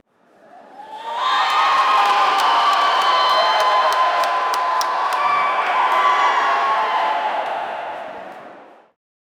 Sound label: a loud excited ooooooh, cheering